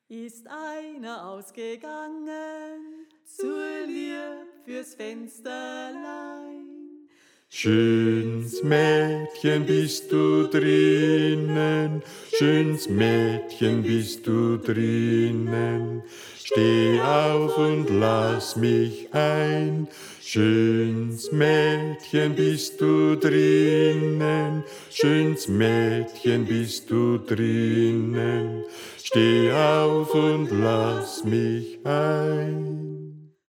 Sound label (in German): Bass; 60-Ist Einer Ausgegangen